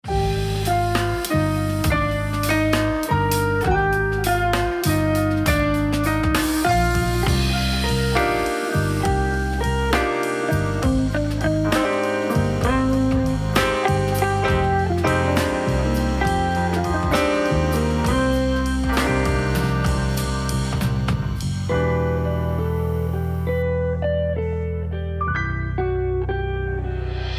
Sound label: Sample Jazz Mastered